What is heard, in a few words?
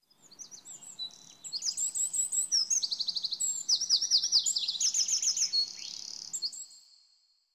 Bird Chaffinch; Warframe; Ambience; Nineteen Ninety Nine